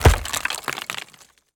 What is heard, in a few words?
creature wood attack impact dust; sonniss gdc